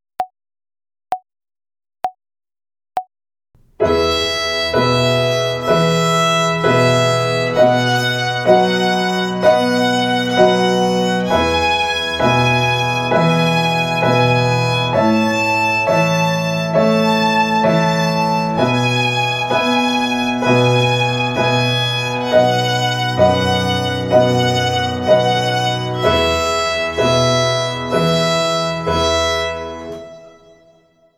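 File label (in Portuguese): Ginástica até o dedo 3 Corda MI Quartenária, Violino; Violino para os Pequeninos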